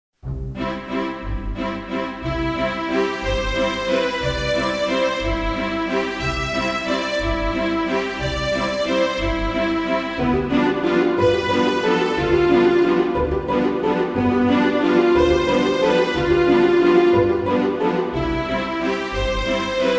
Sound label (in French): Valse Viennoise violons